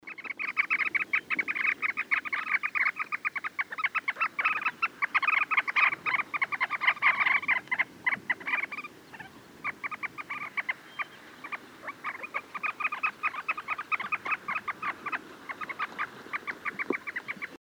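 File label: Pallas's sandgrouse voice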